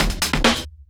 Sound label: drums, Trancefunk 134 F#min; Big Fish Audio; Hardcore Breakbeat Action; Trancefunk Mutator